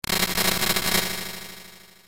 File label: drill; ff3; ff3us